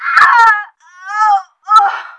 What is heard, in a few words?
death; action-quake2; female